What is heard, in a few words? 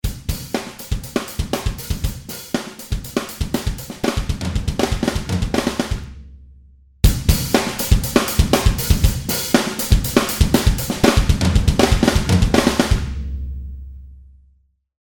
loop